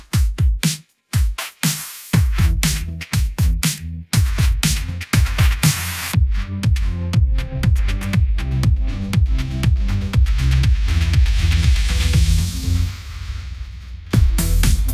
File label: sunshine groove pop instrumental; ai